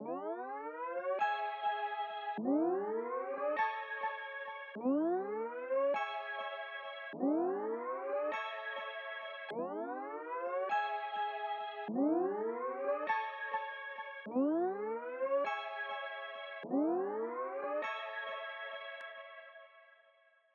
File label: Gross Beat; Beat Starter